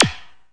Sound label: Tjup digi, Kraftwerkesque; Hip Hop; STYLE KITS (Hip Hop, Etc)